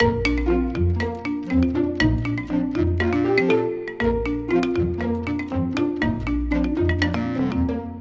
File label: music classic xylo; rayman origins 2011 gamerip; music world